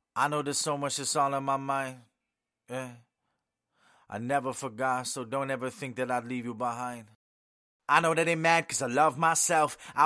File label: acapella, HH 115 Ebm